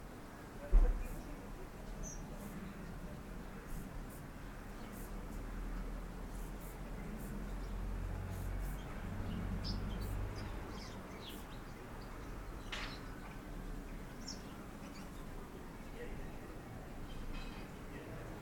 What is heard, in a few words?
lockdown July Sunday late morning ambient soundscape, gardenAmbient